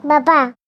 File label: toddler voice "babua"